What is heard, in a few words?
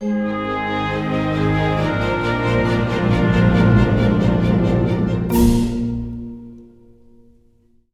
Event Fanfare; game rip; lego worlds monster pack